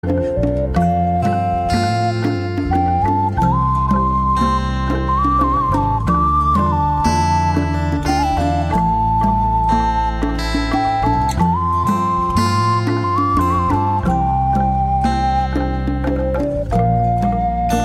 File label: Heroflute